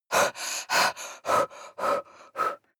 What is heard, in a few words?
breath female pant